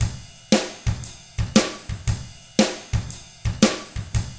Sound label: SHUFFLER, Drums